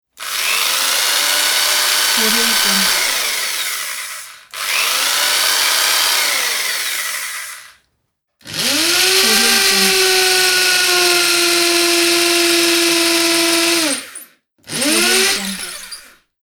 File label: drill